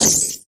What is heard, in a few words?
Multimedia Internet CD-Rom Flash Blast